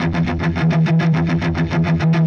Chunk Guitar; Sonic Foundry (Sony Creative Software); Indie Rock Anthems; Session 02 - Clinical

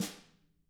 Drums, SNRR; CAMCO

